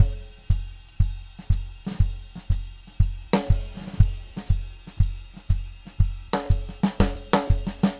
Red Maple Groove 120, DW Red Maple Kit, Vital Drums; 120 BPM